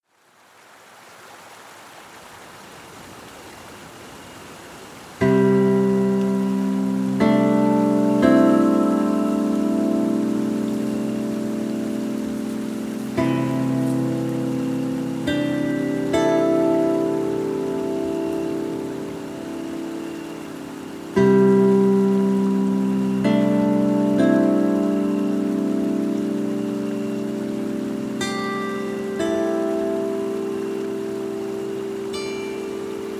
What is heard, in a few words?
Forest River, Harp; Watching the Stars by a Forest River With You on a Moonlit Night; Cadence; Relaxation Music